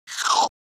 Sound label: monster; CC0 creature sfx 2